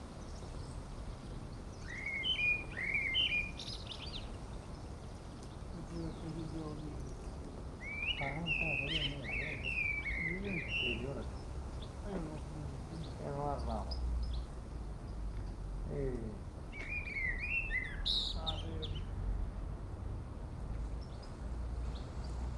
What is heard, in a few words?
wind on the top of the mountain birds and small chats, italy sicily erice; stereo; fvtt sonniss gdc audio